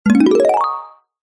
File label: cartoon ascend climb fast mallet; zapsplat